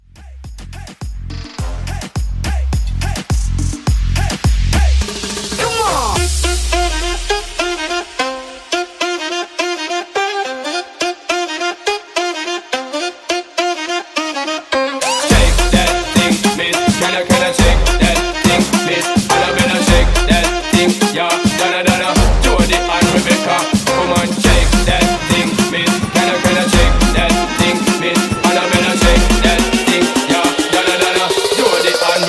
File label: Mashup 105